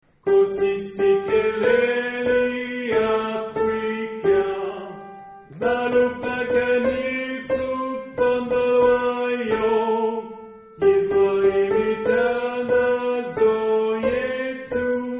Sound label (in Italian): Soprani; Nkosi